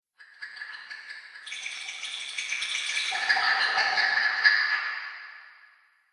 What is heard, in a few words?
Fantasy World Reverb Atmospherics; Scary Soundscape Sound Effects